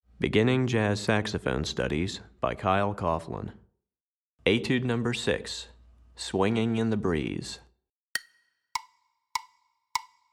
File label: Alto Etude, Saxophone; Beginning Jazz Saxophone Studies Alto V1